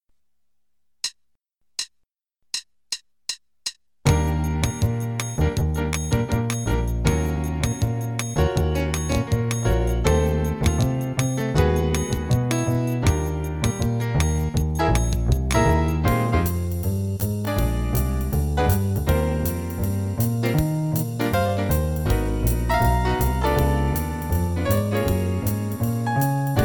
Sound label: Play-a-long, qn=160, in Eb; Jazz Piano; 8 choruses